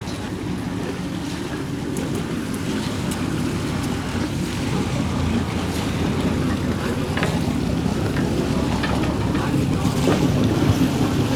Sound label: boat in dock; best service Blue Box; sea traffic